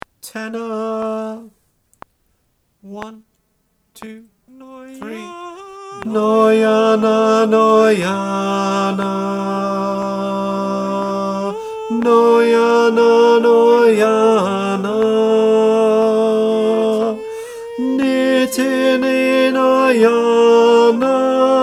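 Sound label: tenor Click track, choir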